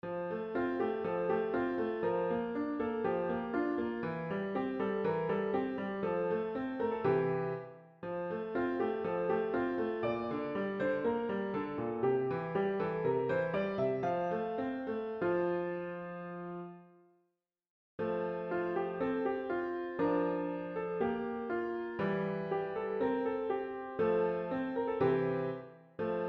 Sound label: piano example